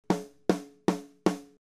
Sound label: snare dry